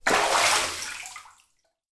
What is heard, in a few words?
household bath hands lift water splash